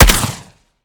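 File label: weap suppressed; weapons; gmod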